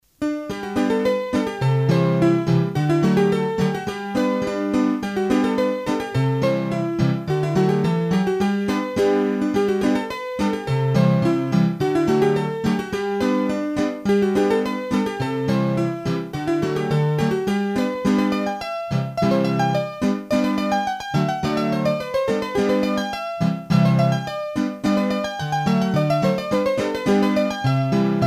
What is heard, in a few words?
Polka Parisienne, hurdy gurdy